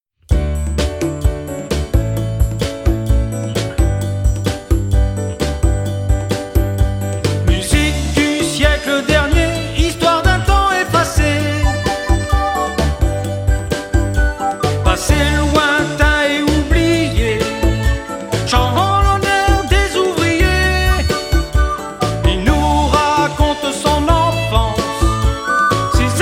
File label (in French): chants d'anciens extrait; partitions chants d'anciens